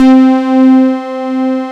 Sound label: MODULAR C5, MODU BASS; Synth